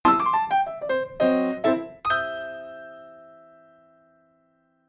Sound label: fin, jingle